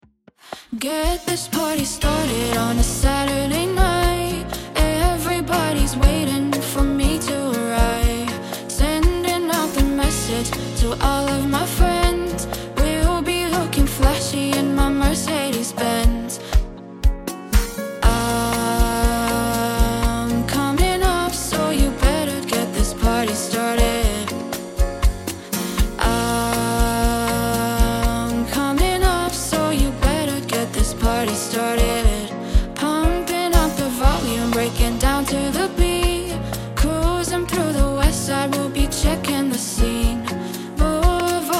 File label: Bossa Lounge Cover; Bossanova